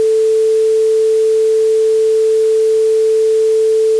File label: spread spectrum; STEGOSAURUS-WRECKS